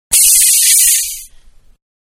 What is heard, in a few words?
Soda Bottle Open; Drink Mixing; Bars; Bottle Soda-Open; Manhattan Production Music